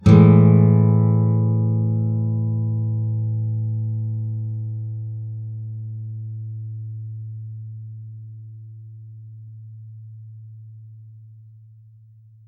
A7th Thick Strs

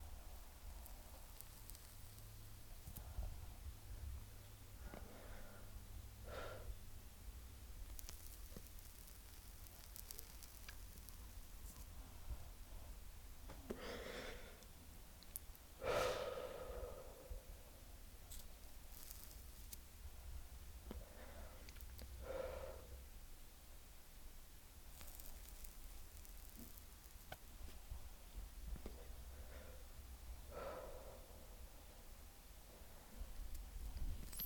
Smoking Variations

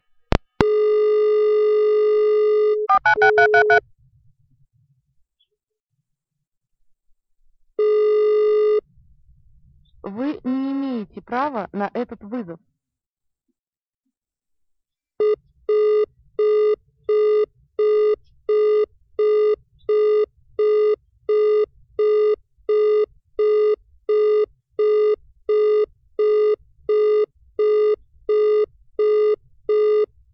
Telephone Connect

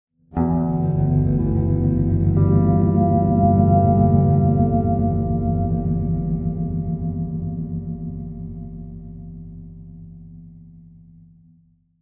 Eerie Guitar Chord E Minor

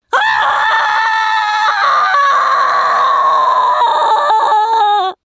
Long Scream

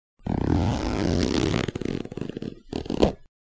Zipper

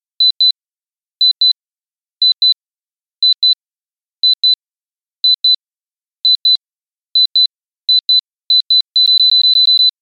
Time Bomb Ticker; The Incredibles

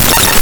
Glitch Element

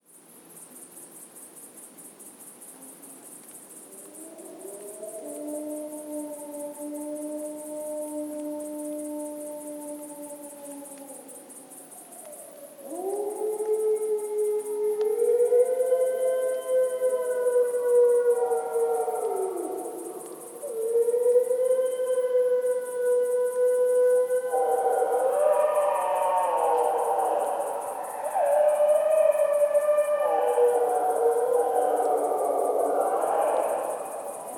Wolves Howling Spontaneous And Free

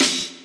Crash Snare

